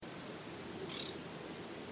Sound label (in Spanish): Polluela Chica